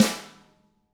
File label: DW HIT, Drums; ILIO; Double Platinum Drums 1